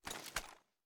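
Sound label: submachine gun inspect; gmod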